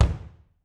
BD, Drums; Bob Clearmountain Drums I

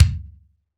Room Kick; Roland BK-5

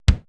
80S DRUM SET; Best Service ProSamples vol.55 - Retro Sampler; AKAI

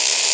Ratchet Roll; Digital Sound Factory Virtuoso

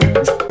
CONGO, Bhangra; AMG; Now CD-ROM (Roland)

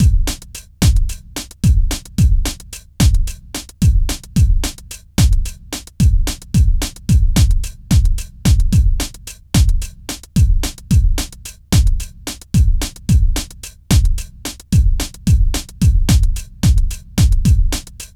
HOUSE, Funky; INIS

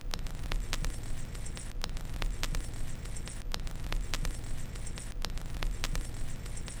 Crackle Loop, Vinyl Noise

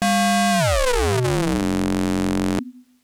Monotron Sci-Fi

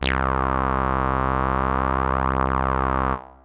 BASS Micro Digi; Natte; Polyend; Legowelt

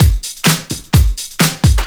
Straight Loop 128 BPM, House

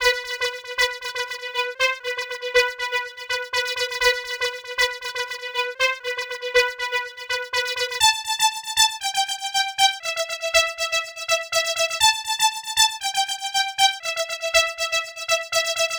Flutter Synth; Sonic Foundry (Sony Creative Software); Club Joints and Street Anthems

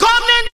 VOCALS; Ueberschall; Jam Box

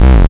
Basses Root C; Vengeance - Essential Clubsounds Vol.4; Synth Shots and Basses; Note C

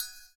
CYM, Ethno Techno; ILIO - Ethno Techno

